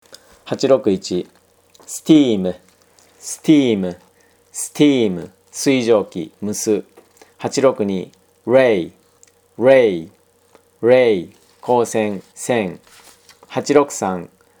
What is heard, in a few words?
tango